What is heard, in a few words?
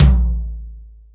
Dry T, BossDR660 ht; drum machine